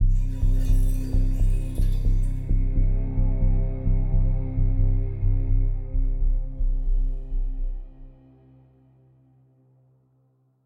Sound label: Chase Outro 87.5bpm